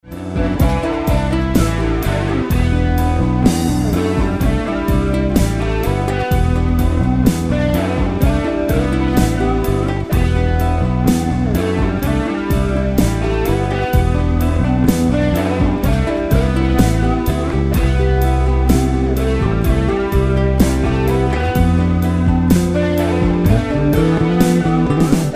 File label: Etheric Ballad